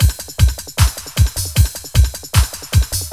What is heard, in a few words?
LOOP, Eurotech; Sounds Good